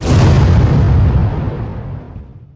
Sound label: explode